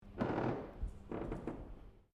Floorboards Creak Distant, Wooden floorboards creaking